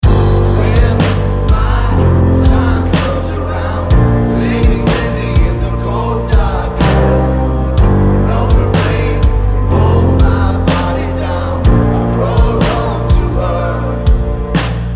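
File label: backing vocals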